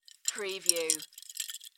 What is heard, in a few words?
Drink Stir Ice; Sound Effects Bible